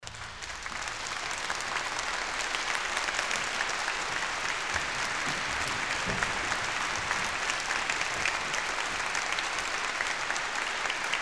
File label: Applause